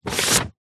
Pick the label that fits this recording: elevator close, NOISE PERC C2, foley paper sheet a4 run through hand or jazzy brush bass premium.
foley paper sheet a4 run through hand